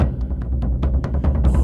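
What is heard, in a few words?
Music Shield; From Dust; ps3; xbox 360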